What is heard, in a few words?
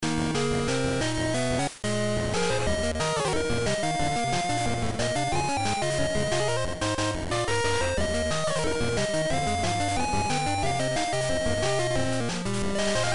8bit